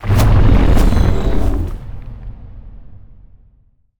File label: necroid moving out destroyer; Stellaris; necroids